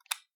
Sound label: switch, UI Audio; Kenney's Sound Pack